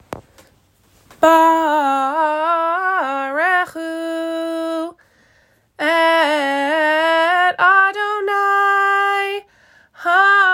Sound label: Barchu